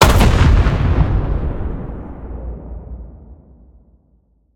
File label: Bomb Explode; Scraprun; Deathrun